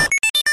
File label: level up, tetris; tetris window